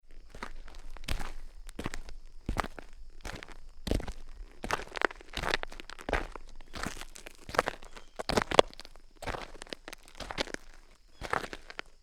walk on dirt, foley

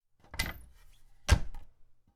cupboard door

